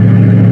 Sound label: engine; q3rally; raptor